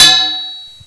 machete metal

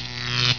electro

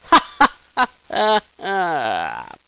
die

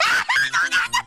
koro scream; korone

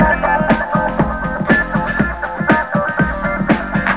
music credits

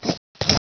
Knife Holster

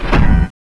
stomp; endboss

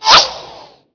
whizz

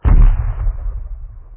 explode dist; Explosions

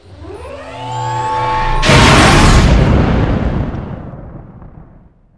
ship slipspace start

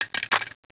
SMG mag drop, weapons; PD2 common

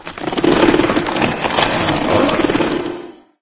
hydra send tentacle; scmod

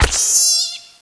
rat die

